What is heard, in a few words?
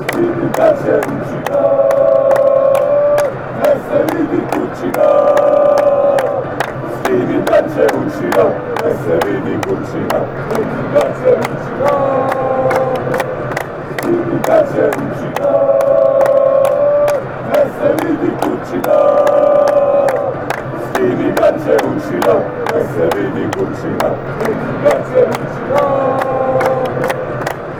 montenegro chant